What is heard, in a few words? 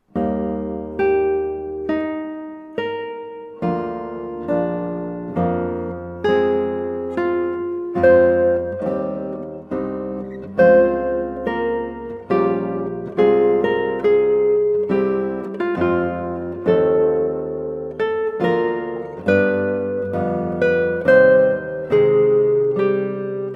Adagio, Guitar; Recorder Sonata in G Minor HWV 360; Handel